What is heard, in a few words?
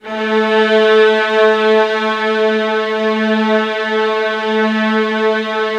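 violins B3; ILIO; Synclavier Strings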